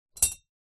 household stone bung jar metal handle release hit jar; sharpe brothers